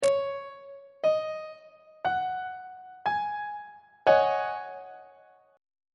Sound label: g sharp dominant 7th suspended 4th 1st inversion on treble clef